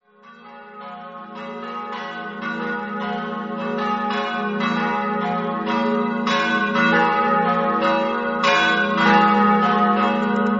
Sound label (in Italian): campane; oleis